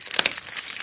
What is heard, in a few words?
Drink loop